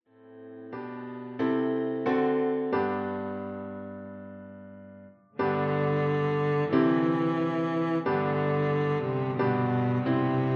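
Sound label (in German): O du froehliche, Violoncello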